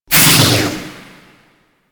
Grineer Space Pod Thruster Reverse Burst, Vehicles; Warframe